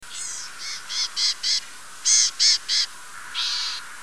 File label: The sounds of birds